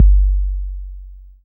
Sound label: kick; Computer Music Basics